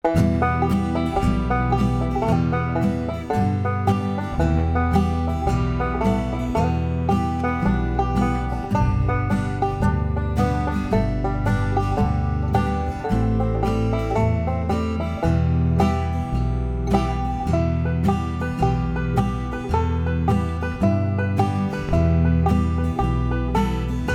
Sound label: 5 String Banjo Natural Style